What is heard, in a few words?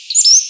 song